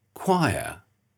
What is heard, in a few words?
choir